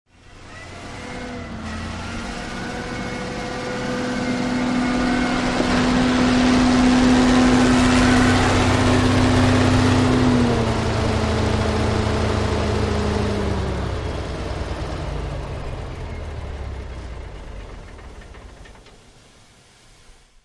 Bulldozer; Bulldozer Up From Mid Shot, Stops At Mic And Switches Off.